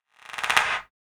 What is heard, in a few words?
CLAP FX